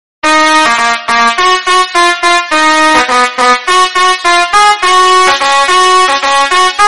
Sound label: telolet